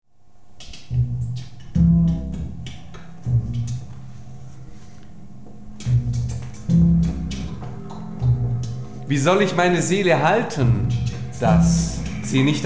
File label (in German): Poesie der Stimme Impro; Rilke; Liebes Lied